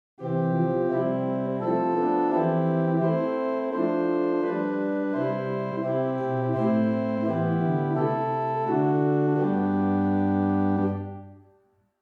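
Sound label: Open Diapason Small 8, organ; Hauptwerk; St Annes Moseley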